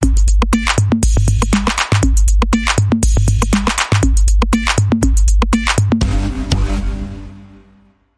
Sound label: Dance Bumper Reversed